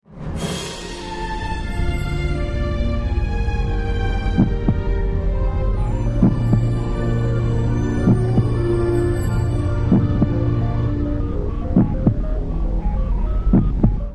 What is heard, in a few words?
craft Belt